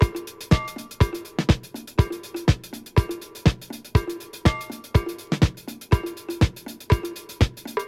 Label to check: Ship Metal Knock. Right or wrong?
Wrong